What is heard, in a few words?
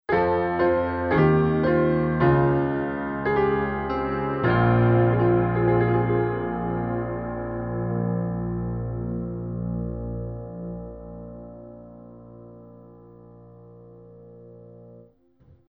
Piano Jingle